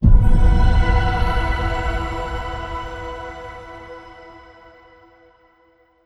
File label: Jingle, Success